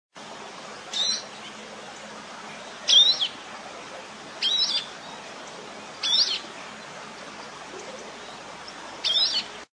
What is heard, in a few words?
Rock Sparrow